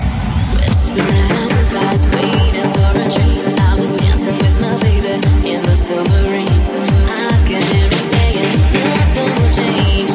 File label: Dancemusic